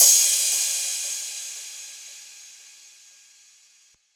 CRASH; Atlanta Heat Wave

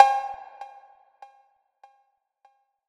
PERC; Godbody Streetz Kit

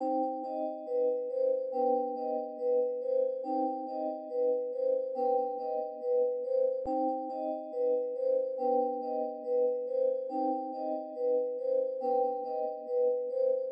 LOOP; Atlanta Heat Wave

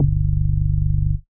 BASS

